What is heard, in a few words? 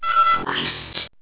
blip; priest